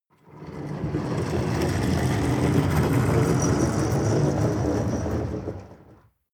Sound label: metal roll large